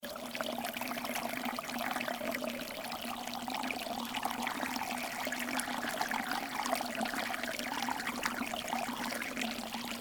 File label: water creek sound